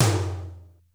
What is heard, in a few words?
ROOM T1; Crypton - DrumBase5000; R70 KIT #2